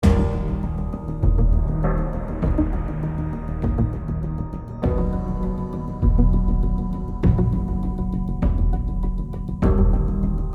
Tense (Loop)

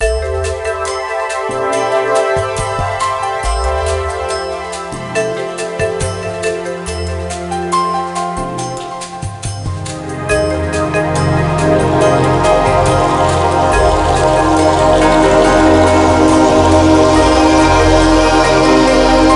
Cave Music; Daring Game for Girls; NDS

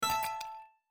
Chip Destroying; my cute commissar 2020 gamerip

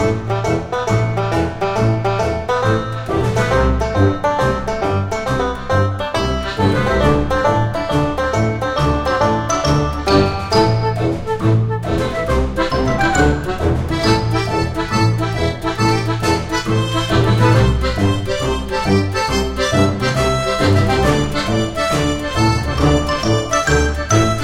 Stinger Quest End Loop; android ios macos windows

